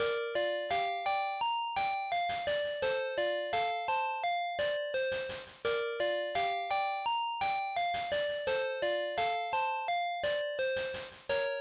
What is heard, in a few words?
Dungeon Loop; GB RPG; bgm